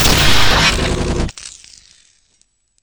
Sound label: test mono; VoxelEngine